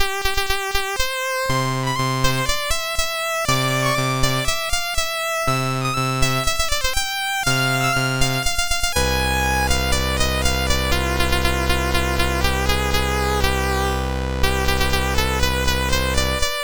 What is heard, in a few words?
Recitative and Trio, MOS6581R2; Pirates of Penzance